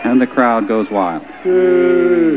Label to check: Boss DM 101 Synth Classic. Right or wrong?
Wrong